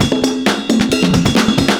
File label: PERCS, 70 s Breakbeats